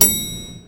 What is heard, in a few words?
elevator ding; gesource; doors